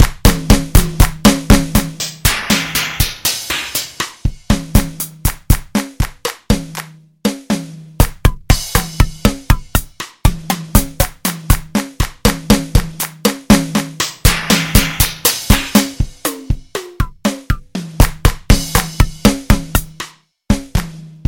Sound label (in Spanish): Ritmo Hydrogen